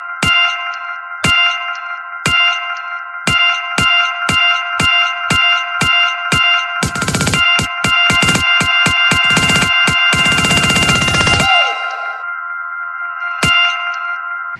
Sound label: Samsung Electro Ringtone